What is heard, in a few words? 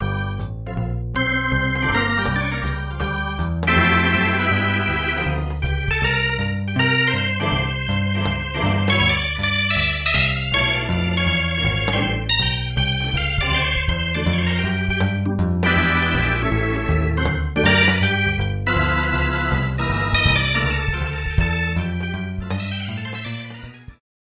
full bars